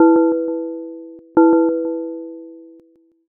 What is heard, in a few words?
gong clock